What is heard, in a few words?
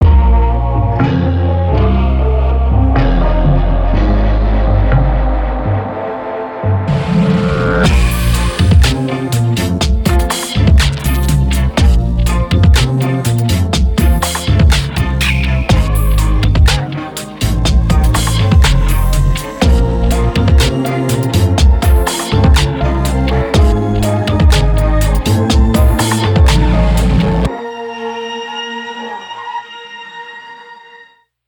lofi daze; triaz by wave alchemy